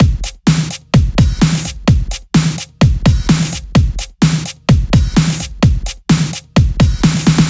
Housebeats 128BPM; Vengeance Samples

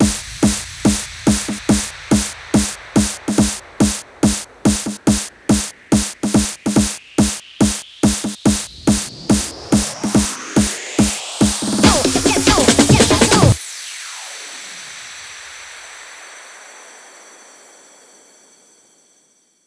140BPM 8Bars, HandsUp & Trance Fills; Party People Long; Vengeance Samples

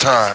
Minimal Perc; Minimal Percussion

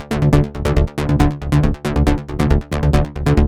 Bassline DRY 138BPM, Dminor; Vengeance Samples; Nightfly Kit

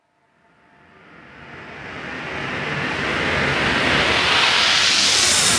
FX Uplifter

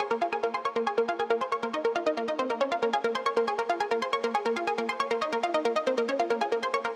Pluck 138BPM WET, A# minor; Melody & Synth; Vengeance Samples; Fusion Kit

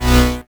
Synths H; Vengeance Samples

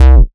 Bass C, Offbeat Bass

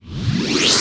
Short Fills & Reverse; Vengeance Samples

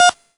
Synth Shots; Vengeance Samples

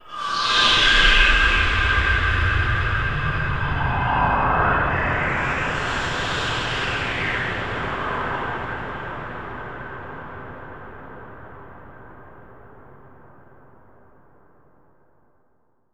VFX Atmos

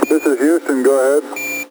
Vocal Phrases; Vengeance Samples; Vocals

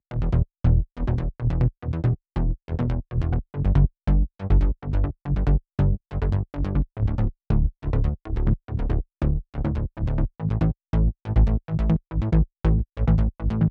Incast Kit 140BPM Bassline Deep, E minor; Vengeance Samples

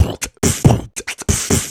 Beatbox Loops, Human Beatbox

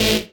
Synths A; vengeance essential club sounds-3

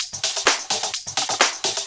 Houseloops 128BPM; Vengeance Samples

